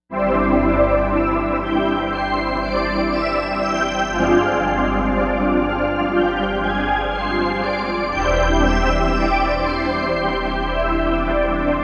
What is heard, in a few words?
big pad